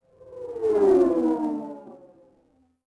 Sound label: distant by; Star Wars Obi-Wan; XBOX